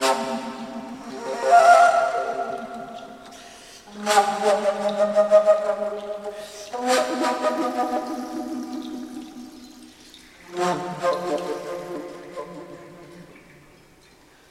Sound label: ringtone; ice; Dai Fujikura